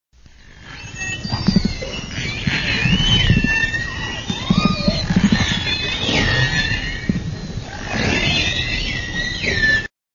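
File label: Long-finned pilot whale; LF pilot whale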